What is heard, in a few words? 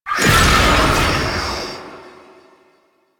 Unyielding Gallop Cast; Warframe; Warframes; Dagath